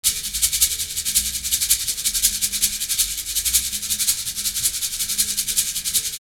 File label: Live Perc Riffs; Jungle Frenzy 1